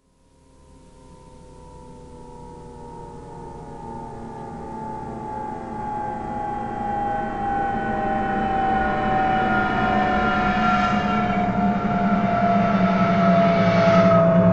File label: Rising Horror Screech